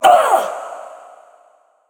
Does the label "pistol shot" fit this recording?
No